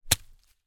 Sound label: foley pine cone drop from tree onto forest floor